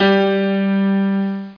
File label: grand piano G3; Star Trek JR Audio